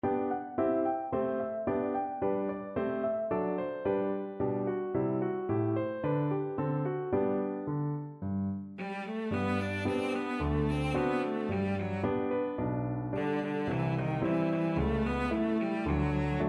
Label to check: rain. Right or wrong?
Wrong